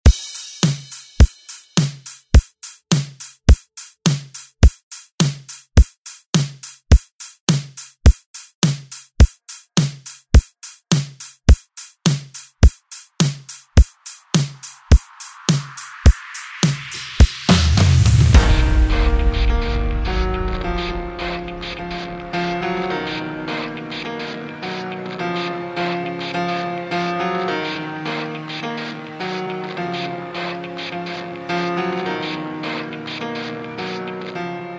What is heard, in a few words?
Re Drum; DJ Mhark